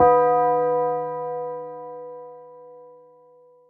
soft hit finish; osu

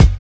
normal hit whistle

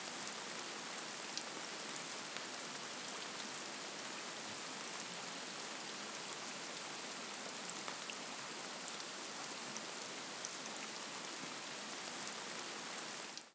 rain; osu

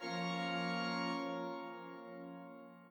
chord

